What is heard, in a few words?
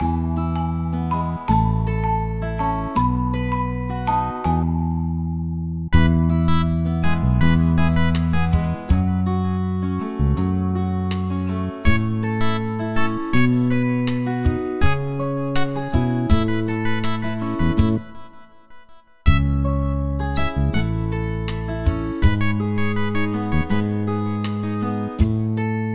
Karaoke